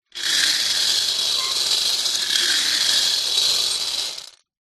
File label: leisure toy bingo balls roll in plastic cage; zapsplat